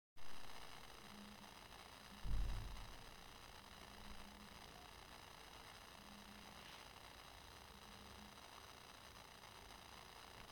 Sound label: sound issue